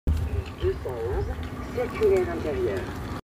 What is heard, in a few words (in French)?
keolis lignes 211